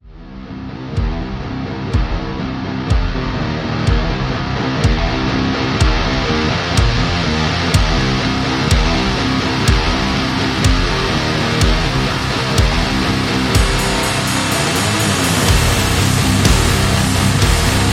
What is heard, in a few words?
Extreme Strong Metal; action metal and rock music